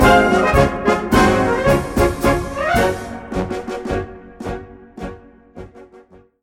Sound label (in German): Vulkanland Marsch